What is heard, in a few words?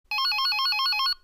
Ring